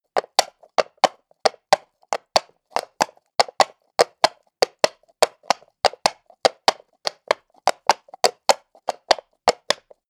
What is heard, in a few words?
horse hooves clack walk asphalt; natur-tiere; pferde